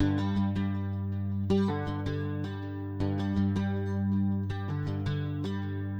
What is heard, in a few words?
drone guitar